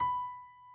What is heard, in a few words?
key